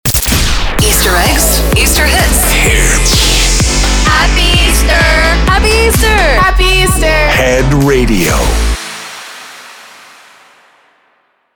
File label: SWEEPER Easter Easter Eggs 128 bpm